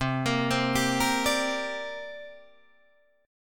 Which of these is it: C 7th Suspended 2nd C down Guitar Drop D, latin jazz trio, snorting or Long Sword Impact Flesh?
C 7th Suspended 2nd C down Guitar Drop D